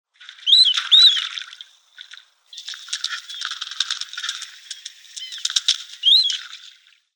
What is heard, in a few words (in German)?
Marmelente Marmaronetta angustirostris, Vogelstimmen